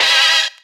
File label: verb